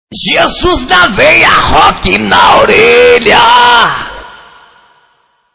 vinheta metaleiro

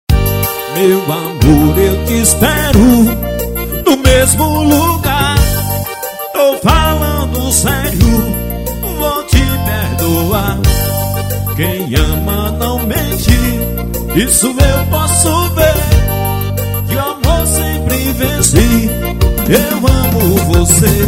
forró, ao vivo; promocional

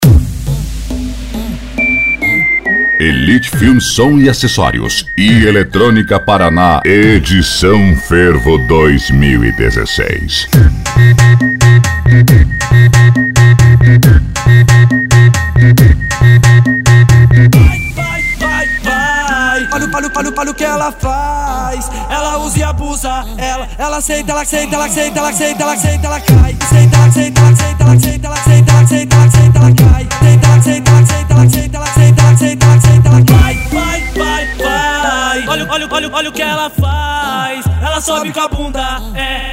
eletronica; parana edicao fervo 2016

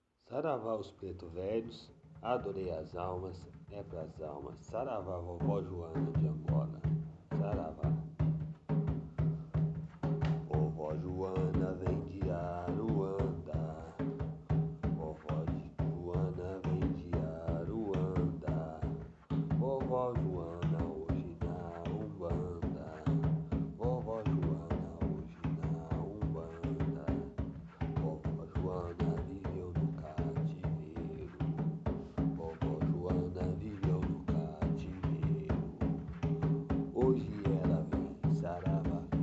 ponto cantado de preta velha, umbanda; vovo joana

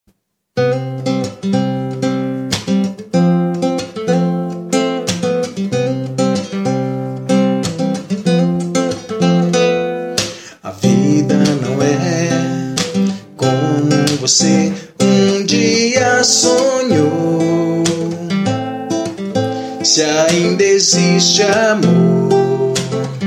voz

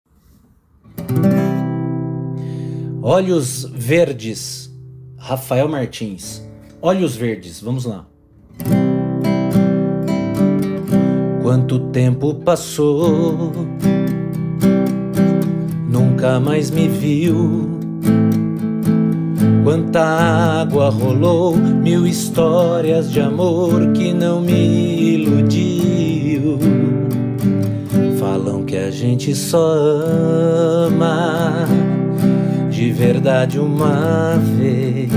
voz e violão

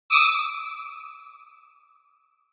efeito sonoro susto no terror

